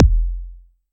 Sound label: Tempest 808 Style Kick